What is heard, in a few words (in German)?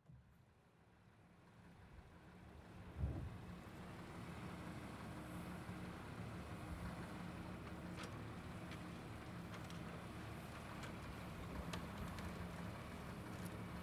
warnowpier holzumschlag